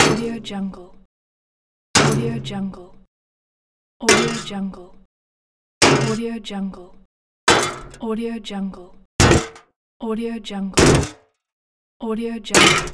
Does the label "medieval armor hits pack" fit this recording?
Yes